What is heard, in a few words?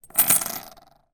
foley money australian coins x2 down on hard surface